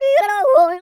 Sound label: YODEL; ILIO; Vocal Planet